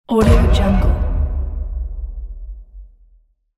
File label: trailer dark hit